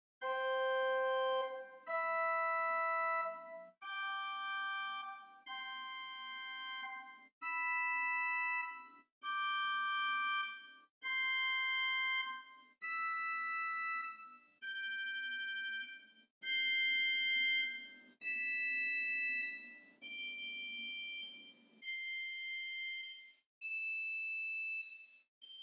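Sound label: Swell Mixture arpeggio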